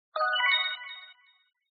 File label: moods